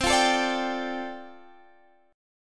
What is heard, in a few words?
D Minor 11th C